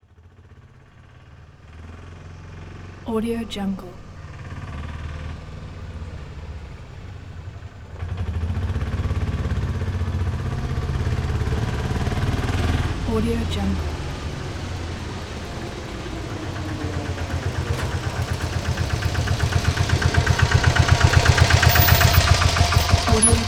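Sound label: motorcycle auto rikshaw three wheeler; نزدیک شدن موتورسیکلت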